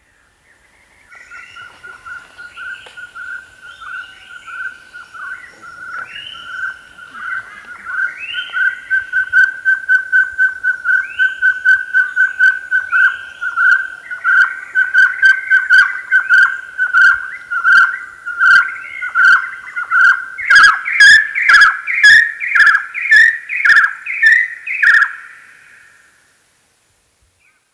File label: partridge; BirdCalls